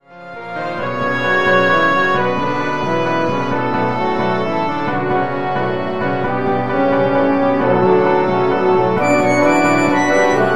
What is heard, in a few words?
Wind Ensemble